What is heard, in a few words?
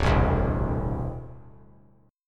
D 7th Suspended 2nd down, chord; D7sus2 strummed